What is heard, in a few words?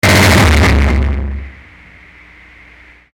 Hit Chopper, Hit Chopper Distortion Static